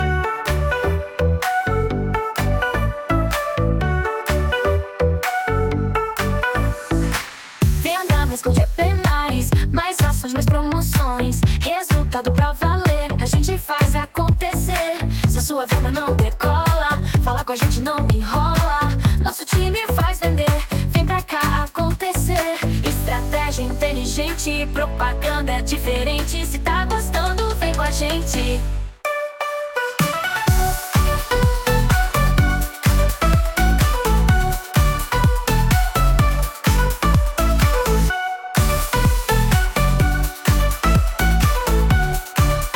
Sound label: jingle; GPmais